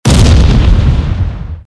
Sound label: cannon he hit